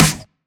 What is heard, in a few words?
Snare; Percussion